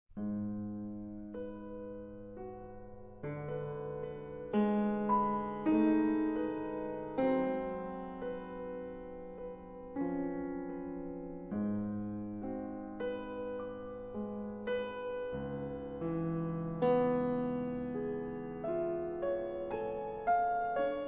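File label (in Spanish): Piano mano Izquierda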